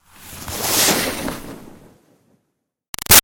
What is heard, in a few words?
Skiing By Fast Snow